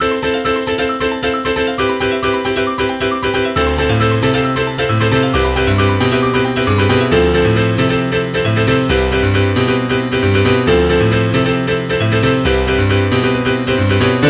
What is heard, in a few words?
Piano; Mario Wing Cap